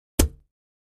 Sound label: Axe Paneling 1 Side, axe hits